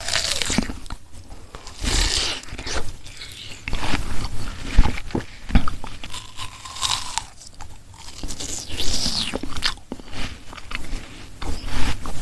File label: watermelon asmr